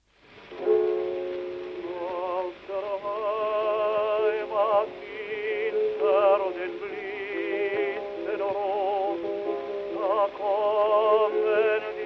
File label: Gramophone